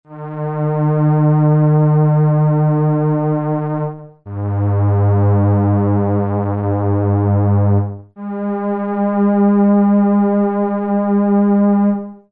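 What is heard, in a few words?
jupiter8 string, Roland Jupiter-8; Strings demo; Product : Jupiter-8 Manufacturer : ROLAND Release date : 1980-1985 Country : Japan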